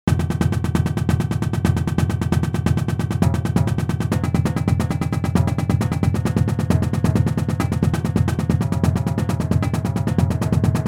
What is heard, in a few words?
sticking